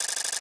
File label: Ammo Replenish